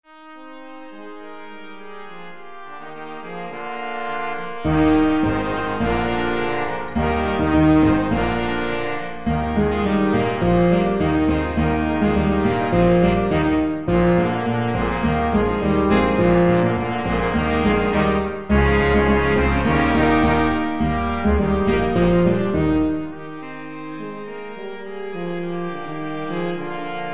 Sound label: jazzensemble aufnahme